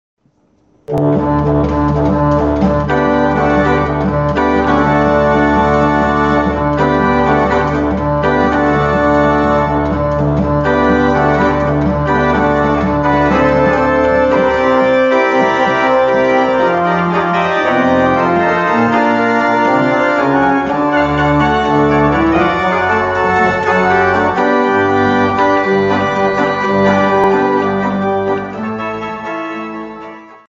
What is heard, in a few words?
Jazz Pop